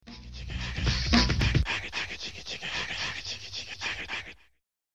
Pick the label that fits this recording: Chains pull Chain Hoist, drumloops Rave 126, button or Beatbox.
Beatbox